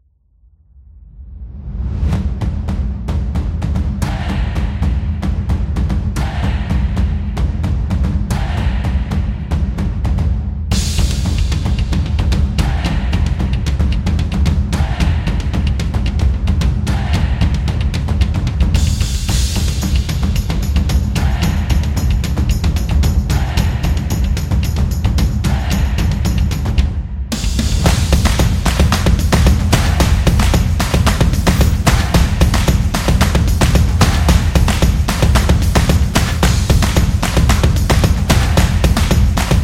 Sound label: The Percussion Action; event promo